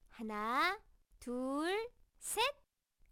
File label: cam Ready; LG SU780 Lollipop 3